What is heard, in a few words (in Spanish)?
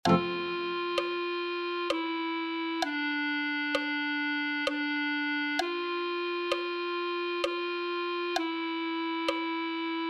clarinete